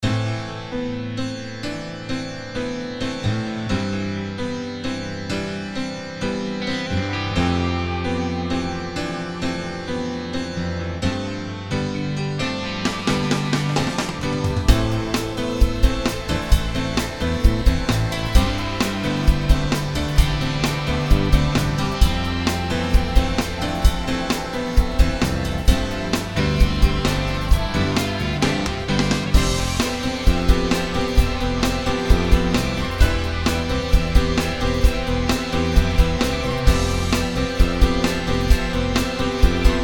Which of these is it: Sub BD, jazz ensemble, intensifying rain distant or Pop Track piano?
Pop Track piano